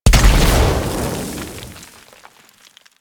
Inferno Comet Hit; Warframe; Ember

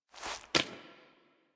Queens Chamber Foley Queen Scepter Grab; Warframe; The War Within

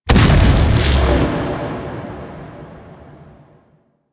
Arachnoid Camper Artillery Cannon Fire; Warframe; Corpus

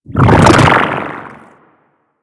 Shield Activate; Warframe; Corpus Nullifier

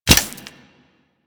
Grenade Hit Dirt; Warframe; Grineer Ghouls; Ghoul Expired; Attacks

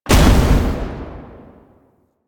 Spear Gun Shot Proj Explosion; Weapons; Warframe; Tenno; Hoplite

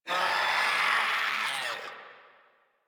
Infested Runner Attack; Warframe; Runners

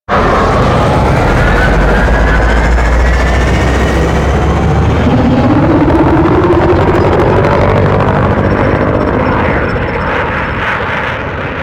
Drop Ship Depart; Warframe; Vehicles; Grineer Drop Ship

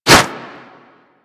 Whoosh, Weapons; Warframe; Furax

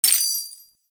Step Spur, Foley, Footsteps; Enemies; Warframe; Duviri; Dax

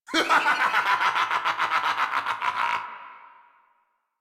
laugh; Warframe; Tyl Regor Boss; Efforts

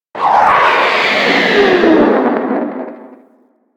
Mortar Fire Launch Whistle; Warframe; Ambience; Eidolon Plains; Grineer Emplacements; Rocket Swarm Launcher

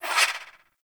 Swing, Melee; Balance Four; Warframe; Weapons; Modular Melee

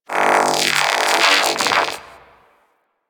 Gas City TV noise; Ambience; Props; Warframe; Corpus Gas City; Props Remaster

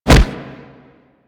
Fighting Blocked Impact; Warframe; Weapons

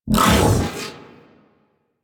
Iron Dodge Burst; Warframe; Warframes

